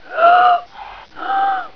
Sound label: gasp; Hexen II; player